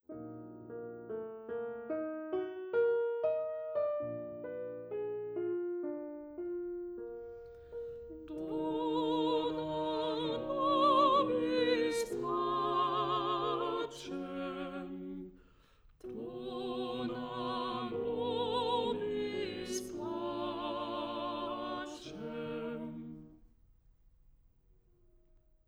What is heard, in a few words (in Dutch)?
Tenor, Agnus Dei; Rossini; oefen CD; Onversterkt